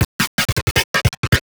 DRUMLOOP D+B 160, Drum+Bass Loop; eJay

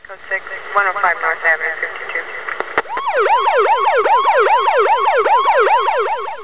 Police Radio; The Amazing Spectacular Ordinary Bottle

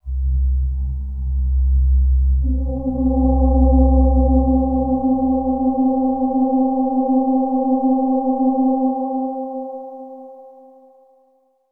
sphere ambient 160

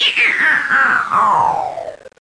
level Down; Net Power 2003 #1

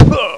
impact; QuickShot v1.0

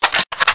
BOLT

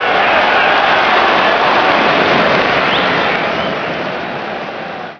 throw; SMSoccer

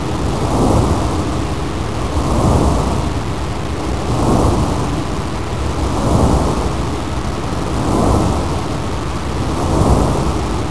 Zed Fan; Mighty Morphin Power Rangers Screensaver

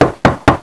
Knock; Halloween Night II

